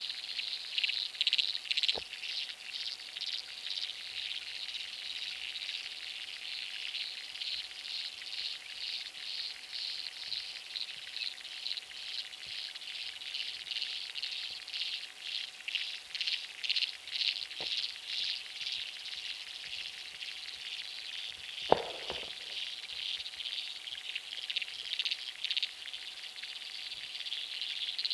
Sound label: March 1 min recording; The Seasonal Soundscape Project